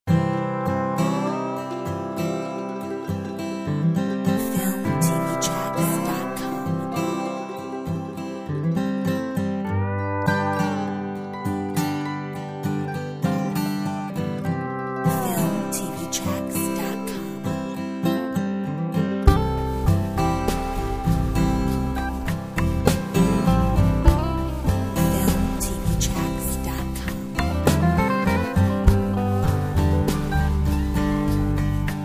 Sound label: Hawaiian Breeze